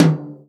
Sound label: tom, Real Drum Kit, jungle kit